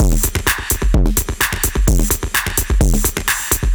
Machine Drums, 128bpm, analogue circuit; musicradar

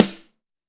DRY SN, STUDIO DRY SNARE

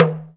TALK DRM, Heart of Africa; Spectrasonics

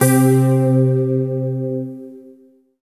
SYN, Roland, digital

